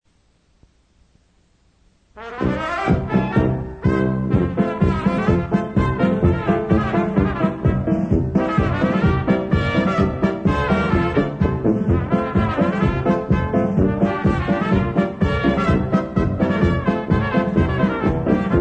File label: CLOVERLEAF POLKA